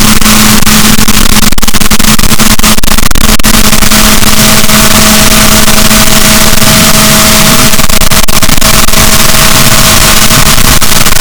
rorquals positif, Balaenoptera Physalus; boat; SNR -6dB

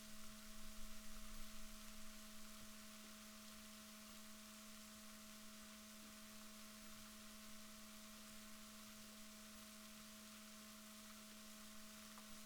rorquals negatif, Balaenoptera Physalus Chile

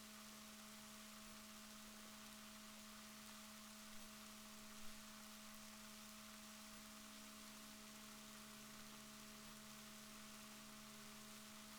rorquals negatif, Balaenoptera Physalus Chile

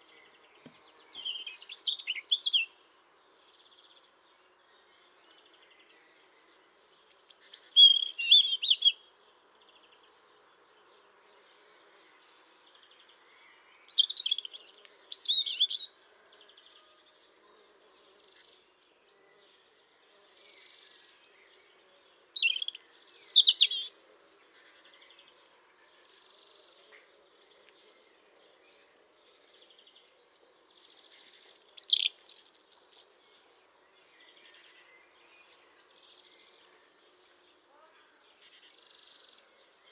Erithacus rubecula; SETE

